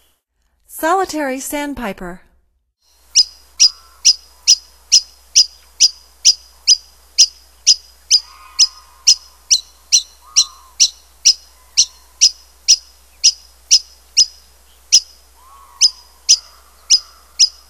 Solitary Sandpiper, Songs of Birds of North America; Stokes